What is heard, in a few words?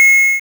pickup coin; cheesy-pirate; caveConundrum